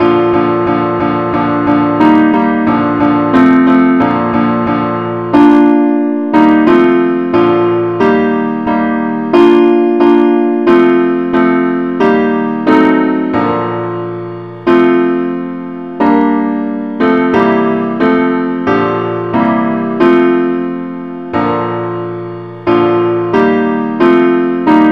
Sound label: simple acc, key bes; christe Sanctorum